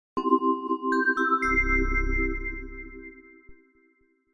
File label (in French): synthe; Logos